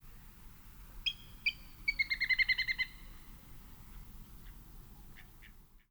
trill nfc

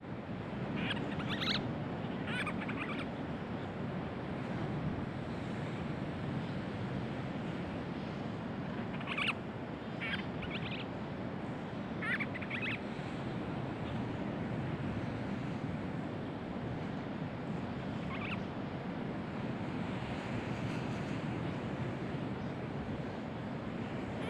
Madeiran Storm Petrel

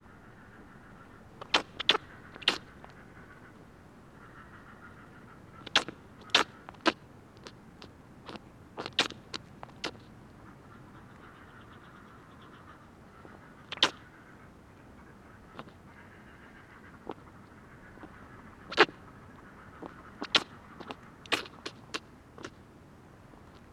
Northern Fulmar

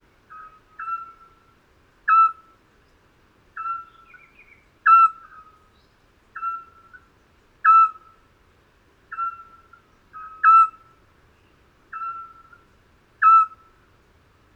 Eurasian Scops Owl Hooting of three males

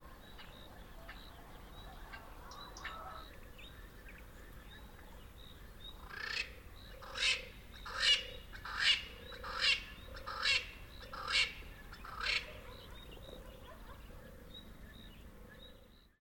Double spurred Francolin

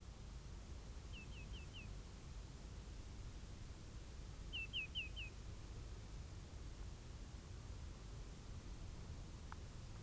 migrating at dusk

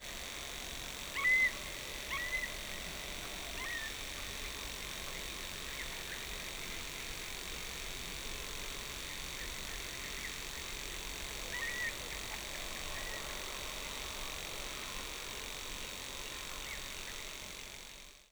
2 note wails

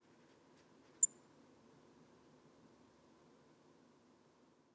long intro flattish end

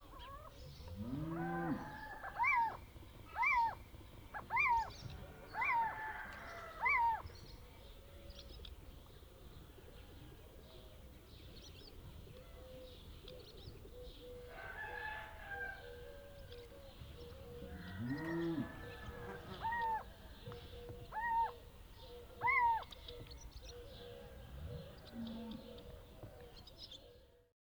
Cucumiau Aggressive song excitement calls Turkey

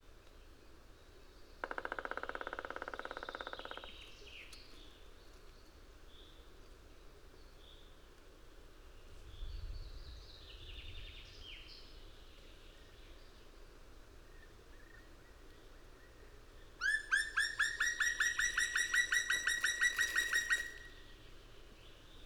Drumming and song of several

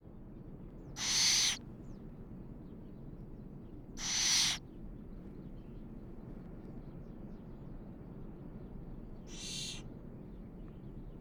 Cucumiau Begging calls of two juveniles

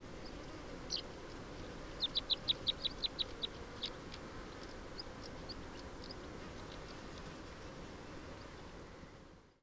chip burst

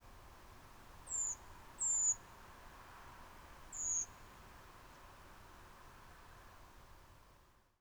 foreleg, Redwing Turdus iliacus; Poole, Dorset, England, 03:41, 30 October 2016; Paul Morton

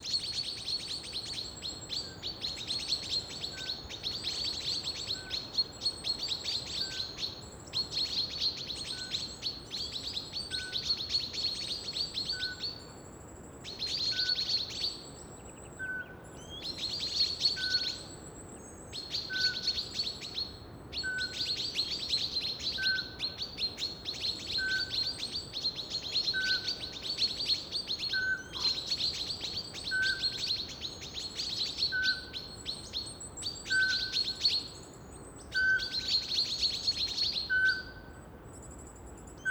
Eurasian Pygmy Owl Hooting male being mobbed

